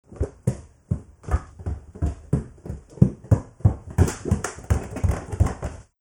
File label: go upstairs